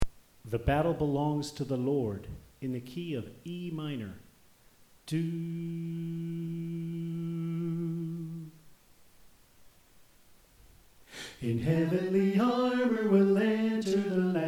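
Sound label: Soprano Left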